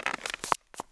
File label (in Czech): vrz; Mafie 1